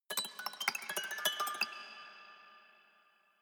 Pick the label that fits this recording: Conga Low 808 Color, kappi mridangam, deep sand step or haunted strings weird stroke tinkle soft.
haunted strings weird stroke tinkle soft